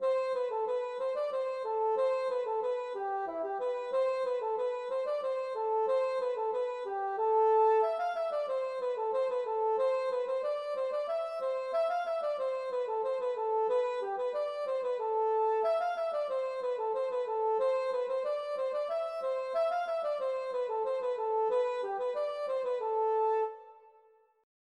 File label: hanterdro; La Drille